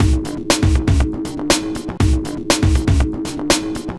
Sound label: scoring pack house beat